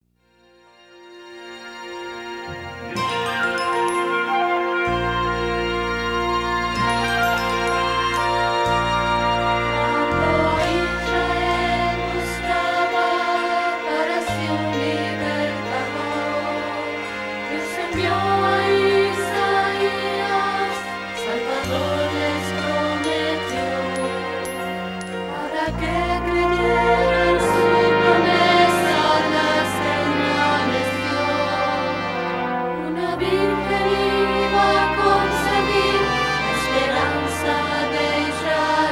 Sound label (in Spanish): Contralto